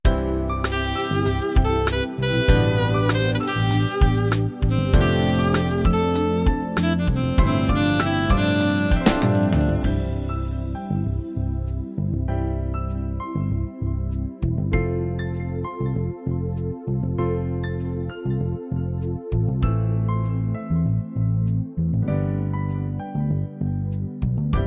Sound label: 80s Boy Band; mzx500; preview pack 01